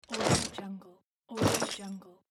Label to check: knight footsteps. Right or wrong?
Right